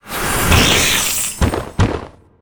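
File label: Fairy Dragon spawn, monster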